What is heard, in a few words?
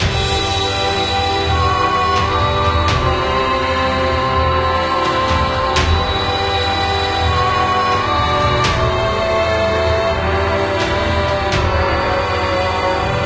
Dungeon; RPG Maker MZ